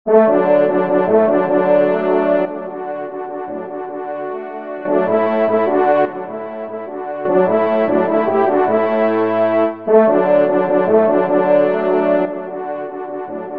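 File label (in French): marche, Do majeur, ensemble, trompe cors; Marche des Chasseurs d'Alsace; F. Schann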